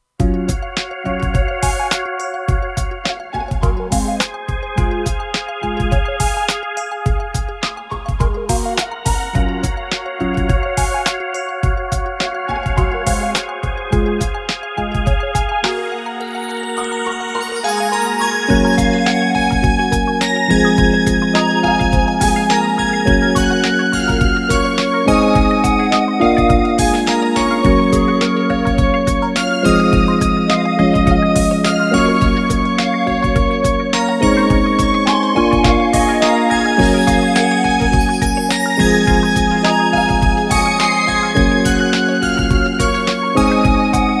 現実：明るめ; Baldr Sky

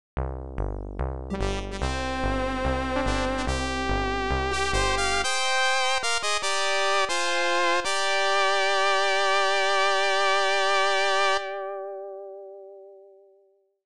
ファンファーレ; Nobunaga's Ambition Tenshouki; PC-98; 披露・問答

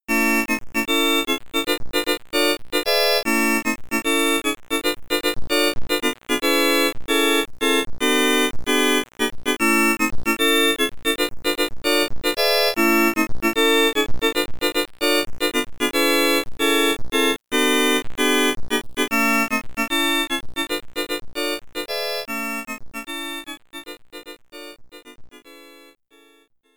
名前入れミュージック1位

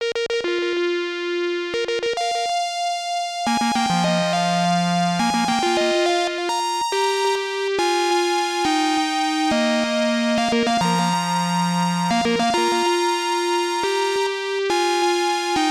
ジングル (X6800 - FM)

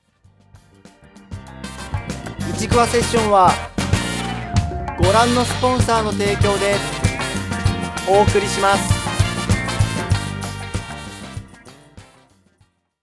ジングル; ichiquewa CD vol3

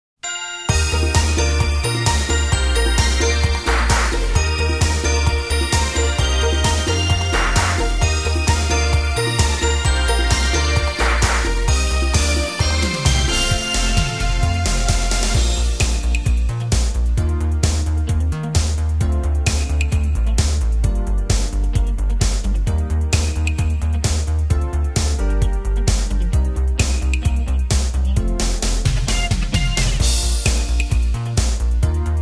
(karaoke); Idol Bouei Tai Hummingbird Sisters

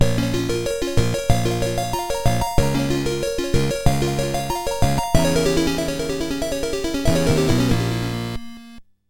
ゲームオーバー; Langrisser Hikari no Matsuei Remastered Soundtracks

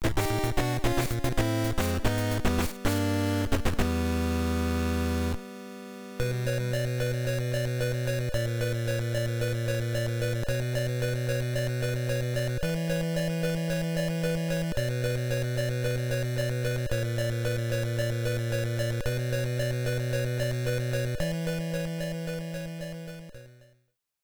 ゲームオーバー; Medarot

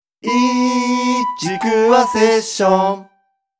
ジングル; ichiquewa CD vol3

